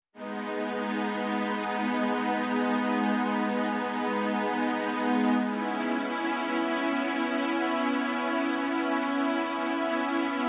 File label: Strings Stem